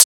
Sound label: Closed Hat; FLPRO